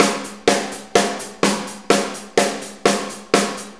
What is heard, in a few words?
Soul Deep, Drum Tools, Loops; Sonic Foundry (Sony Creative Software); Loops & Samples Drum Tools